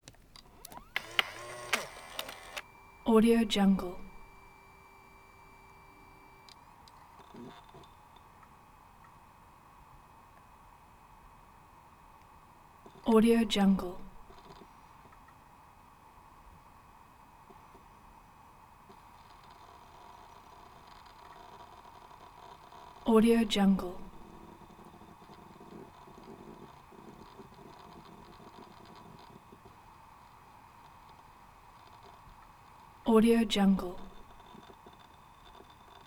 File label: laptop mac boot up servo whir clicks; prosoundfx